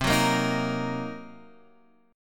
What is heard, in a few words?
C Minor 7th Flat 5th, Guitar, Standard; strummed; JGuitar